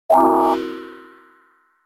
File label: Mail; Gauntlet